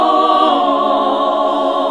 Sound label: SING, CLASSIC VOX; Best Service; Hallelujah